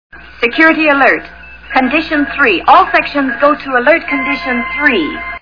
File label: alert